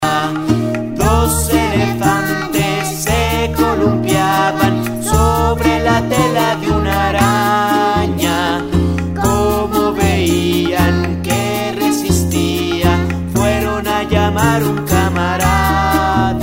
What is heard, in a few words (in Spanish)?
elefantes; spanish